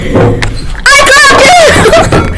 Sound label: goofy kid screaming